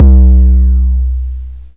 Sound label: 808 dist bd; Kicks; single hits